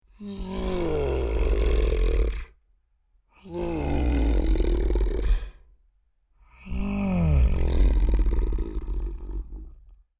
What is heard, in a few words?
Creature Large Whines Sad; Monsters; Horror; Big Room Sound